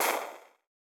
Liquids honey lava

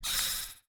lightning bug hurt

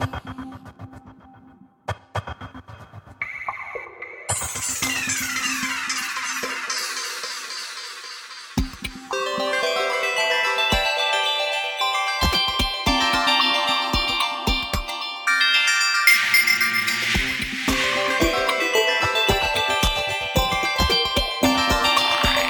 Ice; Terraria

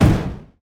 fireball impact

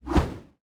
glaive pierce; ghastly

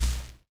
lightning aura zap

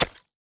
wither beast crystal impact

